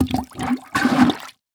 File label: Impact Plunger Plunging In Toilet Single Plunge; Impacts